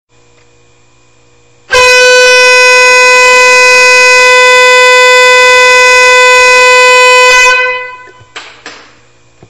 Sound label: Train Alert